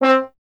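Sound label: bass trombone C; Miroslav Vitous; Solo Instruments VOL-1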